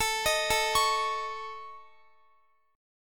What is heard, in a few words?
A Major Flat 5th, Guitar; AMb5 arpeggiated; x,x,21,20,21,21; 4F,4A,5C,5G,5C,6E